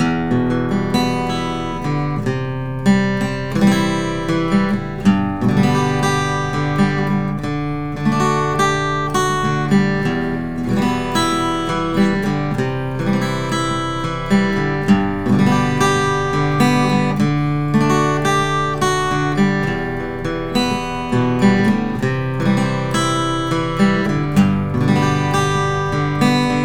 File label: santa cruz 00; natural